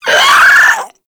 Infested Crawler Pain; Warframe

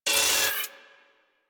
Turret Harness Movement; Warframe; DE; Vehicles; RailJack; RailJackTurretHarness

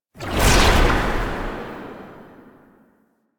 Passive Energy Pickup; Warframe; Dagath

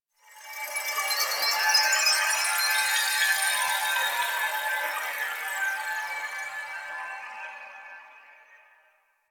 Interior Texture, Ambience; Warframe; Quests; TheNewWar; Cetus; Unum Tower

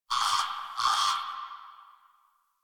Tree Critters Scared; Warframe; Duviri

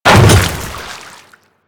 Hammer Boss Step Water, footsteps; Warframe; Grineer

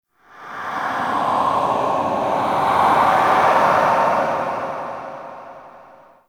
Death Wind Gust Low; Ambience; One Offs; Red Night

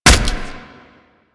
Inf Punch Impact Flesh, Infested; Warframe; Weapons; Inf Kick Punch

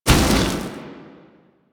Jetpack Thruster Burst; Warframe; DE; Vehicles; Veilbreaker

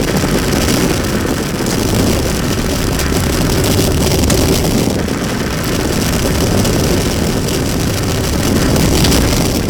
Movement Forward Burn Loop, Vehicles; Warframe; Archwing

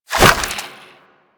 Idle, Weapons; DE; Warframe; Sentient; Shedu

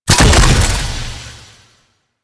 Shotgun Shot Alt B; Weapons; Warframe; Tenno; Alchemist

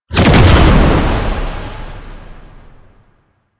Research Pod Activation B; JadeShadows; Ascension

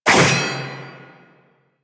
Sword Whoosh; Warframe; Teshin; Weapons

